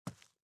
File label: SFX run rock